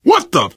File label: reaction startled, player survivor voice biker